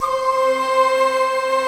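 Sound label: Japanese flute, Big Japanese Flute; Optical Media International; Sonic Images Library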